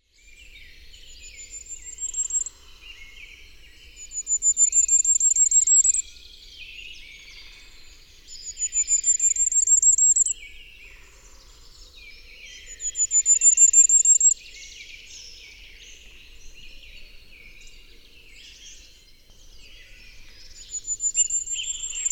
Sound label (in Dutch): Vuurgoudhaan Regulus ignicapilla